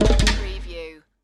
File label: Musical